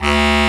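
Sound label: Bass Clar mf D1; Digital Sound Factory Virtuoso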